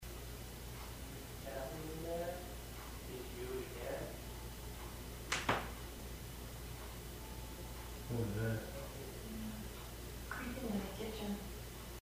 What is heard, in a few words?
kitchen noise after question; amherst